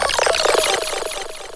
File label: Nexus Spinback; Urban Shakedown